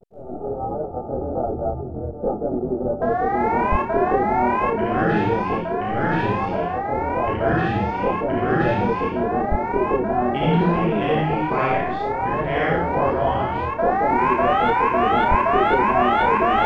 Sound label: Emergency Call